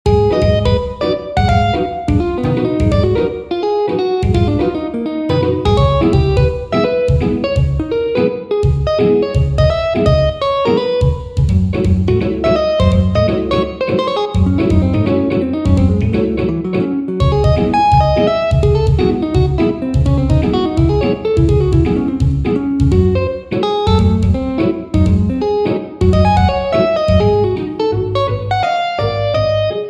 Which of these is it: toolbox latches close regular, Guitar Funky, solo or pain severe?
Guitar Funky